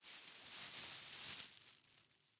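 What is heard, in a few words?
sounds of an electric garland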